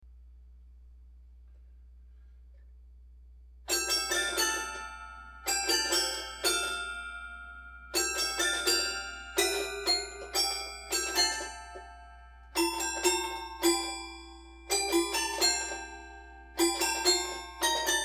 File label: Toy Piano